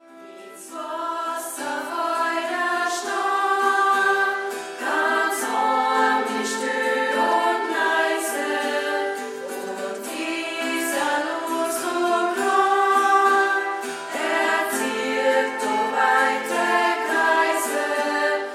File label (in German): mundart; Kirchenlieder; tauflieder